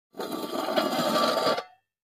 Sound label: Coffee Can Scrape On; barrels buckets & cans